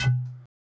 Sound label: bottle, ethno, 125